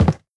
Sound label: step light, footsteps cardboard